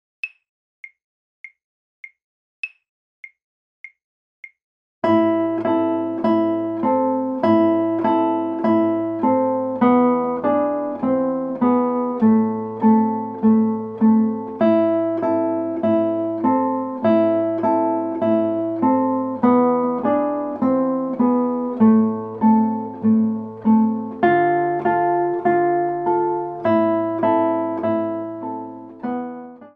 melodie aus russland

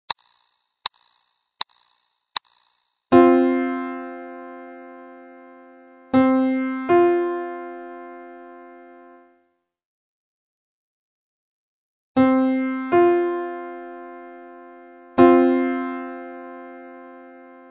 die intervalle

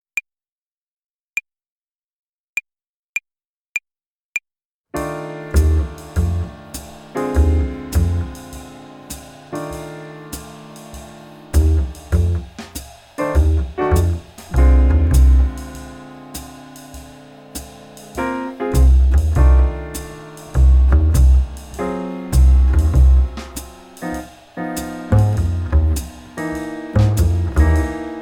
blues tonleiter uebung play along slow

